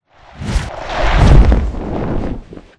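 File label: flyer land medium distance; boneyard; Titanfall 2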